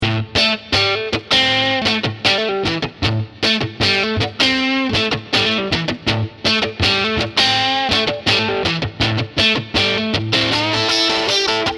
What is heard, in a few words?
riff